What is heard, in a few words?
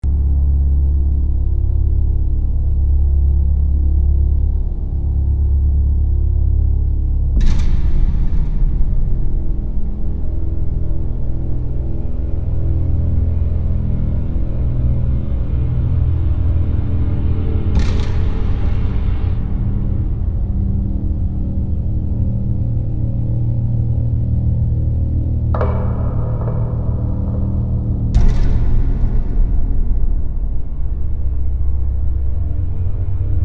atmospheric; quake 4